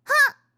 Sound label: swing, fighting